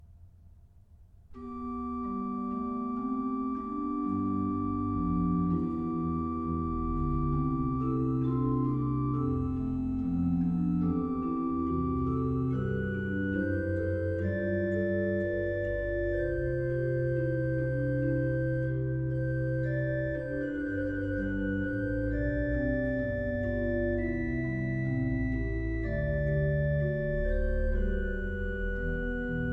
Gelobet seist du, Jesu Christ, BACH ORGAN, Chorale preludes; Orgelbüchlein